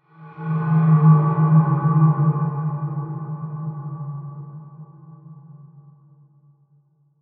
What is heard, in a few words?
SWELL CRYSTAL, Swell Crystal Deep; Production Element Imaging Element Accent Transition